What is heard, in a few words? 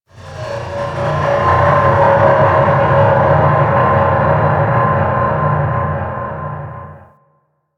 dark telemetry; Warframe; Corpus Railjack; Ambience